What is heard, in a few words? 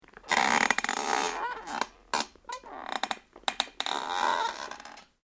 METAL CREAK; Materials